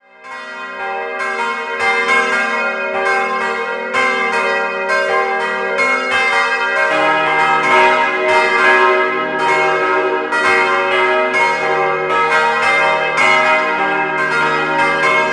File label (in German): greller glockenklang